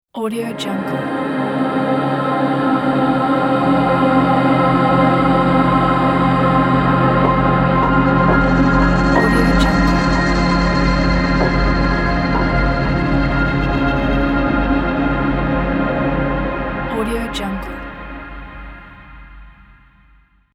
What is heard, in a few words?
beautiful atmospheric dreamy logo